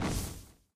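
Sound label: Disappearing Platform; Doodle Jump 2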